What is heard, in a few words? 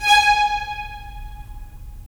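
strings violins, right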